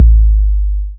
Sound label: kick; E-MU Formula 4000 Series Vol. 1; Hip Hop Nation; Bonus Perk